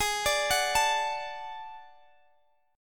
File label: D Major Ab, guitar; D/Ab arpeggiated; JGuitar